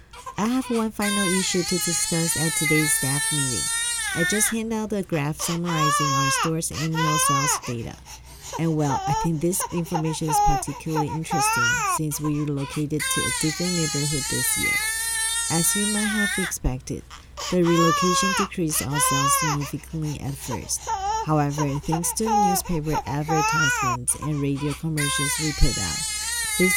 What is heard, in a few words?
babycry Feature OFF